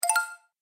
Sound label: Musical Game Elements, Video Game